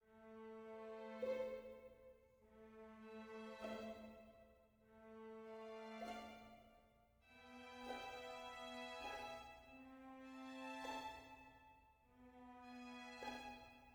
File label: mysterious soft